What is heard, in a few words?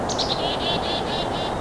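chickadee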